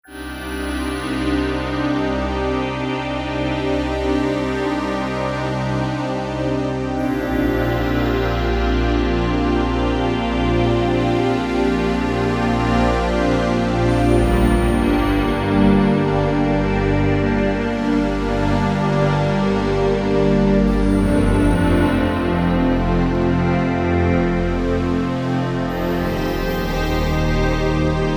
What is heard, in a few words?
The Big Pad